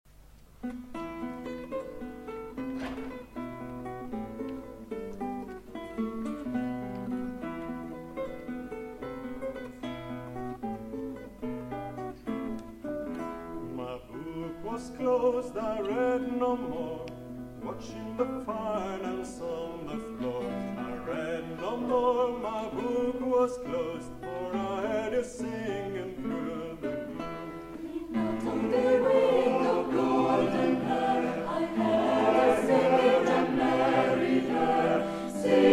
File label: lean out of the window SATB